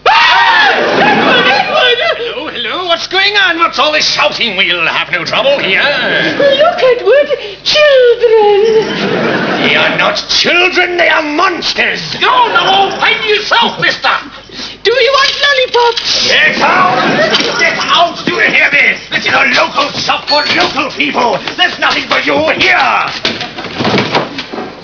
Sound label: children